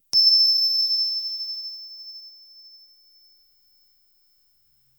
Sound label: plop fruit drops softly; fruits